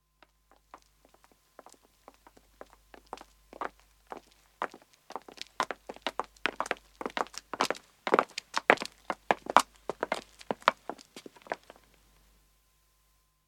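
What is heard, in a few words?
Footstep pavement walk, 2 men 2 women approach and stop; BBC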